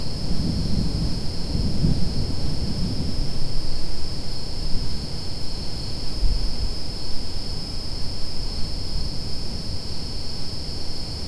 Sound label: a quiet forest at night, campfire